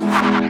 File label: Mariachi Guitar strum; WrestleQuest